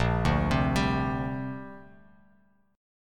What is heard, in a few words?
Bb 6th Add 9th, Bass Standard; 6,5,10,12; Bb6add9 arpeggiated; JGuitar